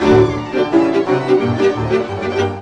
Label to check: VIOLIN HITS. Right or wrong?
Right